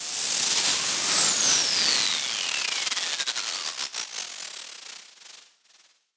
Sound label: SFX Down, Dubstep